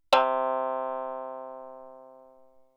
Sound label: shamisen